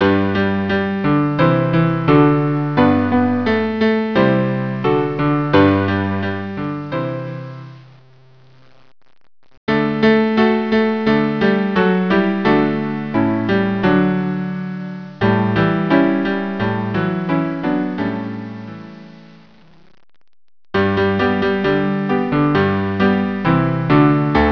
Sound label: cello